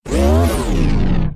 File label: Yawn Voice